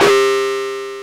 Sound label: SYNC WAV LO, INST WAVES